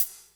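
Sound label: closed hi hat, 150 BPM; Drum & Bass; Ueberschall; Drum & Bass Resonance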